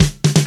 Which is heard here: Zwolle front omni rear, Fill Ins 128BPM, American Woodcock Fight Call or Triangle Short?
Fill Ins 128BPM